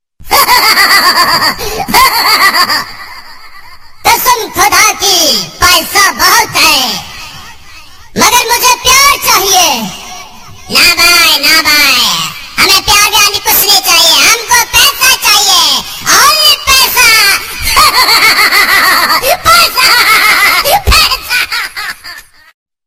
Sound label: Hahaha Funny Hindi